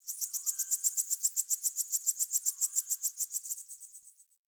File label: Tree Critters; Warframe; Duviri; Ambience